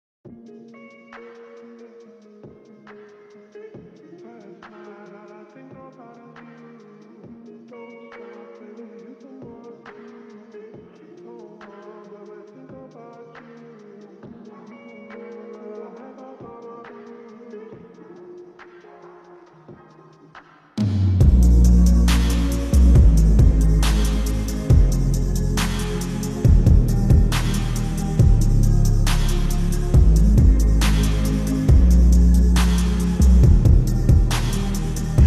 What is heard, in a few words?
slowed reverb